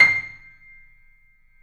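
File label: piano, C, right; InVision Interactive - The Piano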